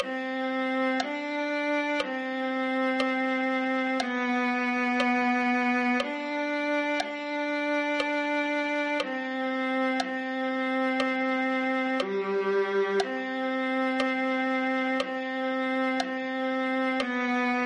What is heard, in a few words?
viola